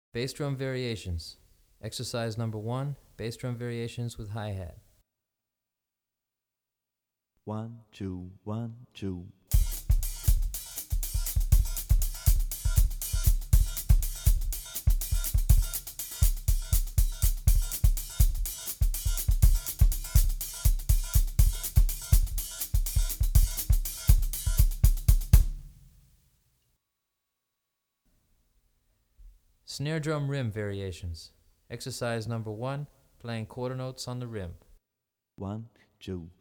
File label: Bass Drum Variations Snare Drum Cross Stick; Brazilian Rhythm For Drumset; DaFonseca, Weiner